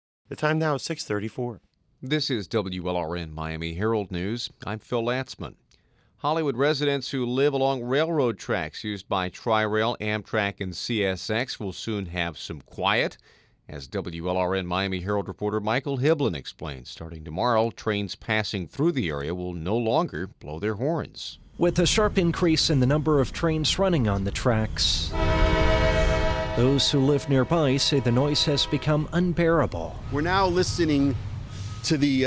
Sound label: Train Horn